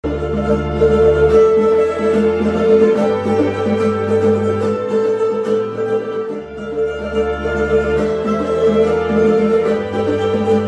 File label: orchestra ringtone